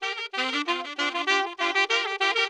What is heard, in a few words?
OLDSCHOOL BRASS 96